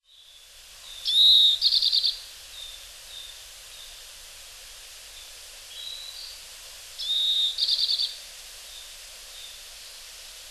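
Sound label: Oriental Greenfinch; kawarahiwa; hyogo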